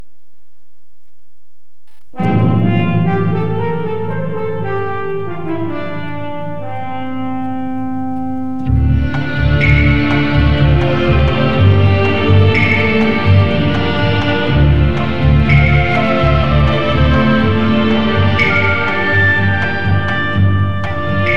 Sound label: neo traditional japan